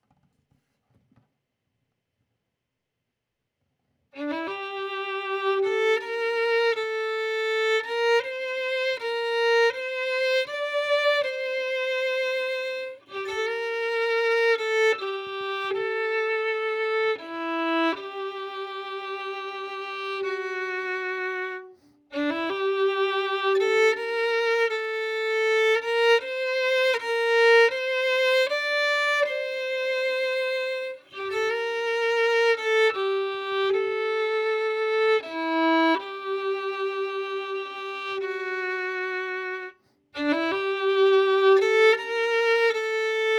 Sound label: Violin; Verdigris Music